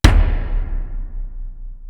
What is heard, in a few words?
power toms; East West; Global Sounds